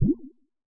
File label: new message; luxu admin; red-valley